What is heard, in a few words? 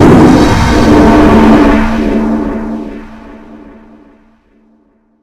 jumpscare sound